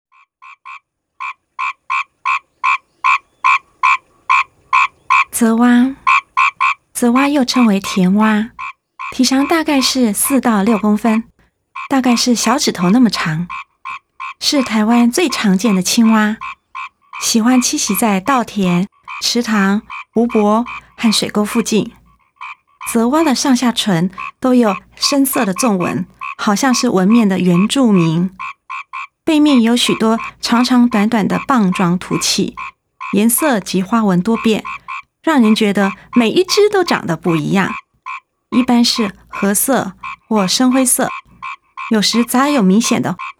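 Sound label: Rana l. limnocharis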